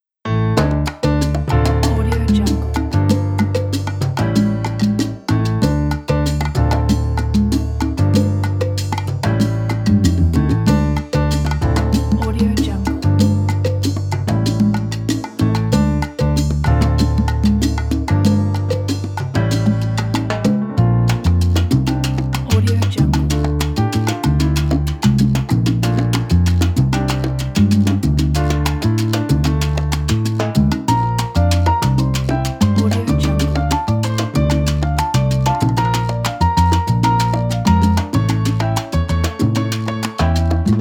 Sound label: salsa